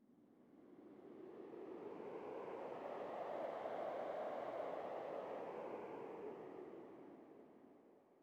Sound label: sting wind gust; Rust